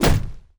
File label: m4 silencer off; m4 beast; csocf weapons pack